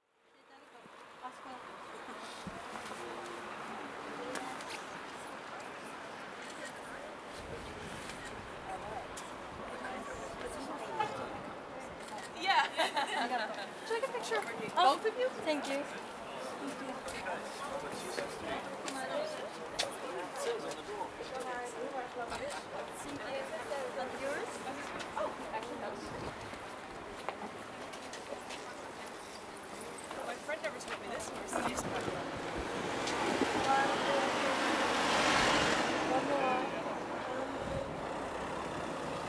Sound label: street tourist ambience; Amsterdam; Anna Frank house